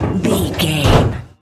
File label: door metal draw slide close